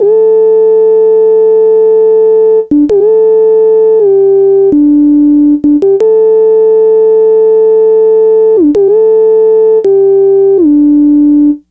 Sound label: SYNTH LEAD; Keyboards; Leads